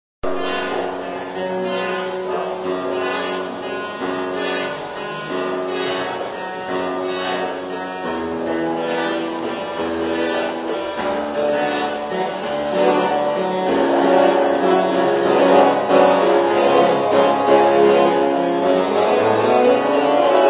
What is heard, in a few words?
harpsichord